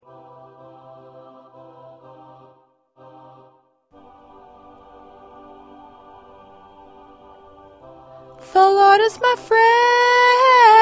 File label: Lead 1